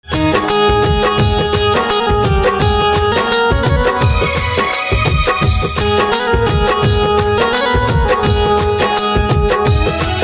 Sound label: Banjo Instrumental Ringtone